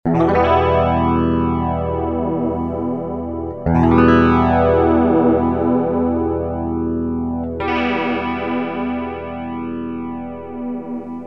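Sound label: clean electric guitar boss bf1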